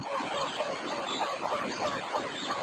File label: Red Throated Barbet call noise reduction; Amar